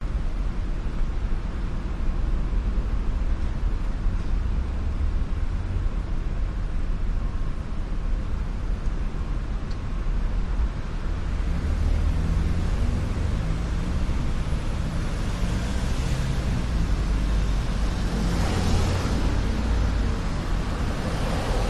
vancouver night traffic roaring traffic cars engines honking driving; dunsmuir; howe st; pedestrians; your free sounds